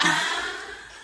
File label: ah sexy; ooh ahh